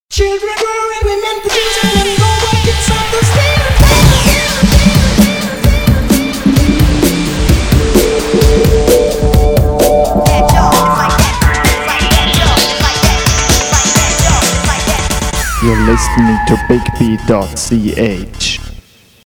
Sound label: Jingle